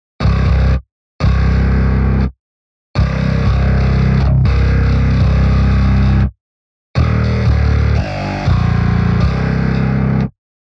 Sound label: supermassive bass wet; polarity audio